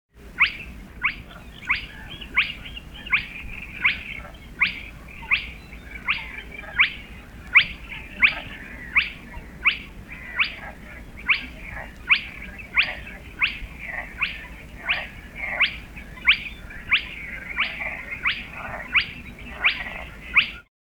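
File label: pogonysh golos, spotted crake voice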